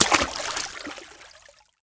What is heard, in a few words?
slosh; cstrike; Splash effect sounds